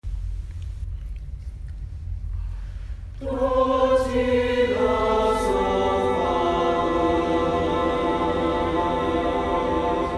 Cluster chord (Major)

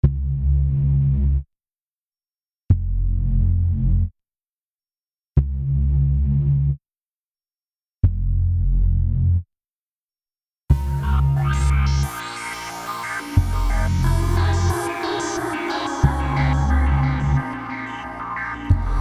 remix; outside loon

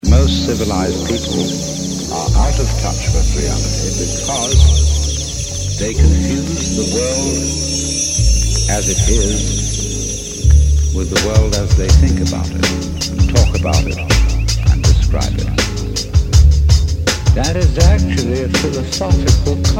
dj mix

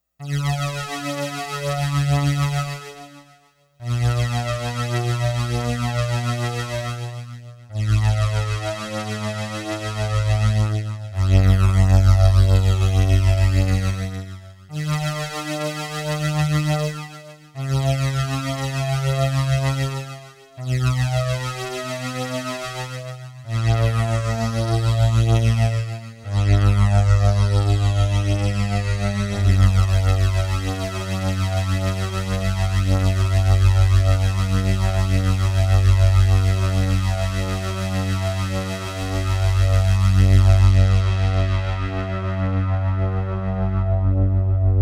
Space Synth Tone

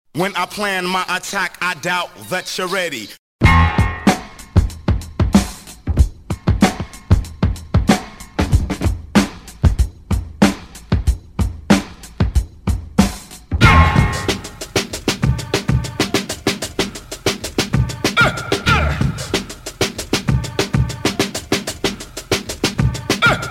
cuts beats breaks samples stuff hella breaks; threepeeoh

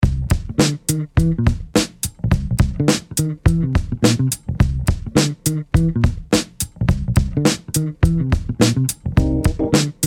studio scratches funk

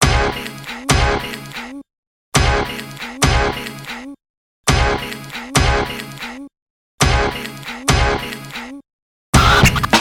Boom Scratch

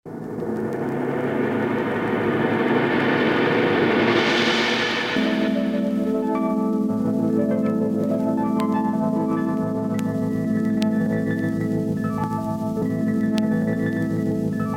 Blues

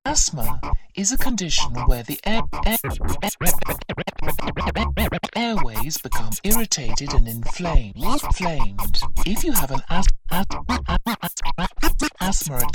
Scratch

